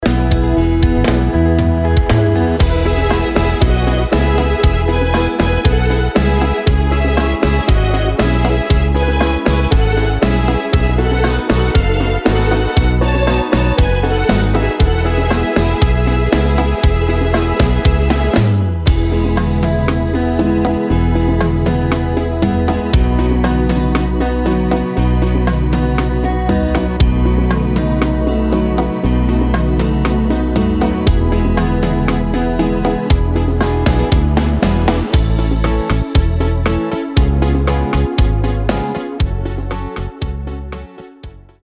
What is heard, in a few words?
Ballad